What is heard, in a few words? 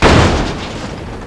CRASH